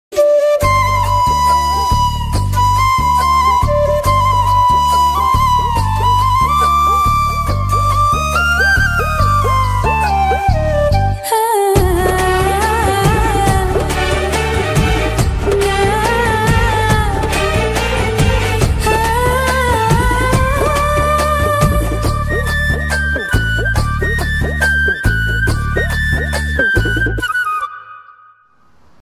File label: heroine bgm ringtone, kannada; guru shishyaru